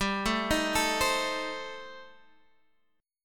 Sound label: A-Minor 7th Flat 5th G, chord